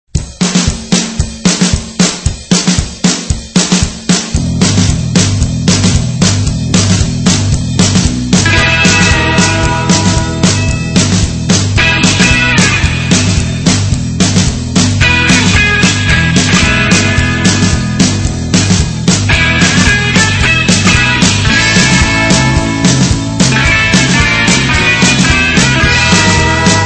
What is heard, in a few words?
(instrumental)